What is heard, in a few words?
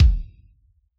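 bass drum rock, Yamaha DJX Rock Kit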